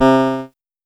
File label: Casio VL1 Guitar; Giga Samples Collection